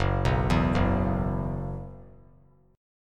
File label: F sharp 7th Suspended 4th; F#7sus4 arpeggiated